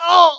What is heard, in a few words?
hit, pain; mohaa